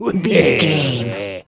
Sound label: voice fun man character deep laugh